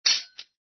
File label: METAL DROP